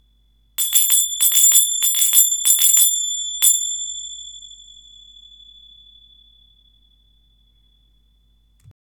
ghawazee bronze zils

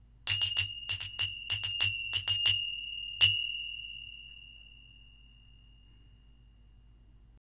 brass small zils

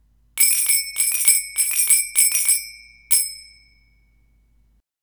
silver paisley zils

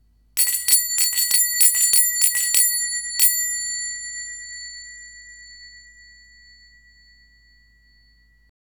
brass zils; tutankhamun

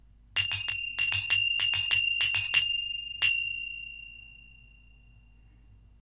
basic silver zils

